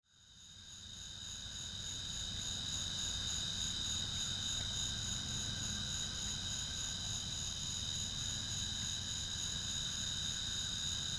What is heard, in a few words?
Crickets